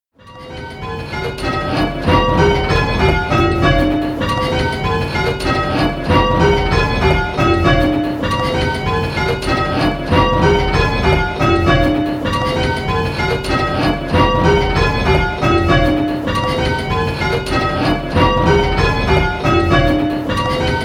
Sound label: 6-Bell; Who's leading; Which Bell is Leading?